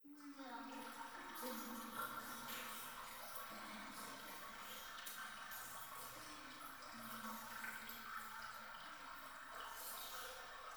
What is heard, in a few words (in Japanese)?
トイレ フェラチオ 川のせせらぎ